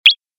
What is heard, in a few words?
score Bell; loderunner